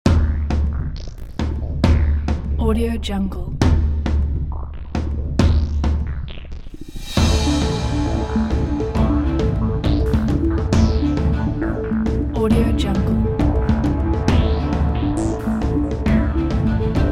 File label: action epic intro; lucaformicola